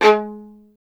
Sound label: STR VLN, Solo Instruments; Miroslav Vitous